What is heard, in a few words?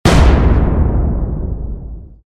dramatic explosion